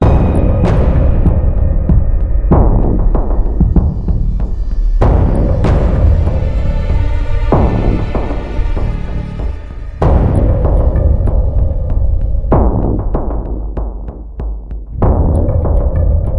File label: suspense